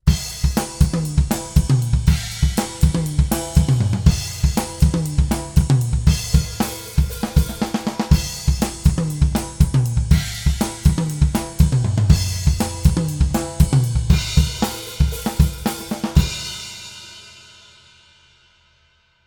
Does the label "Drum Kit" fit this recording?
Yes